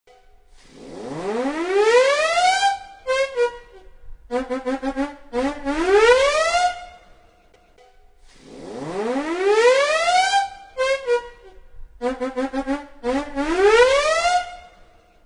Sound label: sirene; hercules